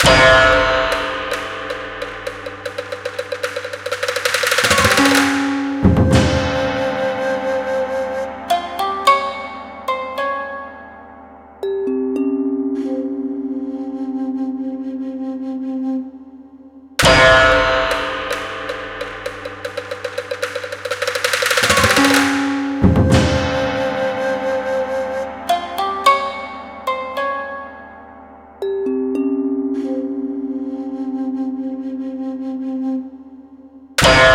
Lose; Enso Sushi; Cooking Dash

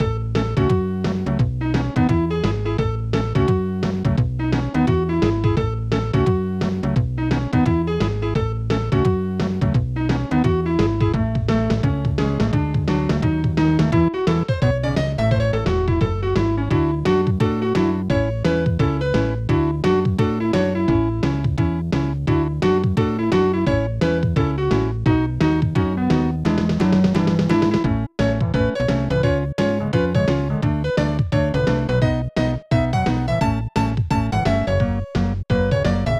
Drum Solo; jelly boy; snes

